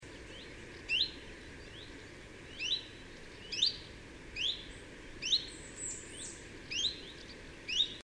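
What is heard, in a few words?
Chiffchaff (Phylloscopus collybita); Noarootsi, Läänemaa, Estonia, 6 August 2005; Call 1 (seen); Linnut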